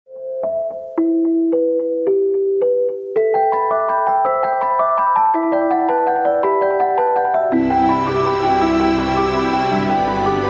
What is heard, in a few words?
samsung ringtone; samsung led 1